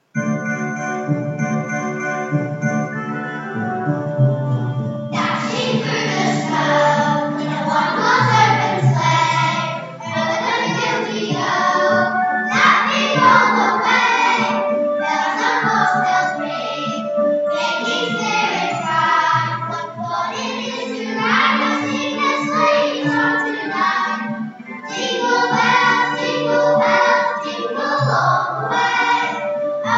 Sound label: Jingle Bells